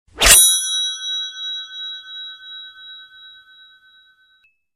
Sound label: sword